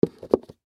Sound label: Gift Box Set Down; Foley Gift Box Full Set Down Cement Surface; Set Downs (Sound Effects:Objects); Blastwave